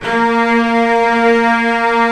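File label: cello A#2; Digital Sound Factory Virtuoso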